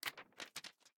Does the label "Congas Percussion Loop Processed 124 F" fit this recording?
No